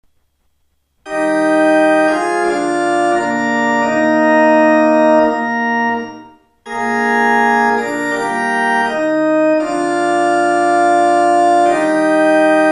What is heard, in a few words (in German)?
Stern, auf den ich schaue Orgel